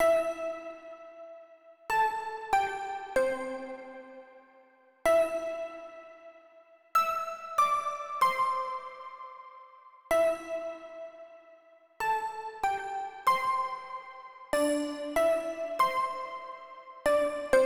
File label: Pluck Melody